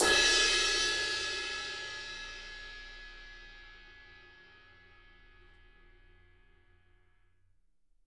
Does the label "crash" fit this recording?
Yes